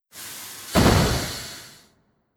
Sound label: Roaster Over Exp